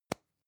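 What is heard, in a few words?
lipstick open, Bathroom; haus-garten; badezimmer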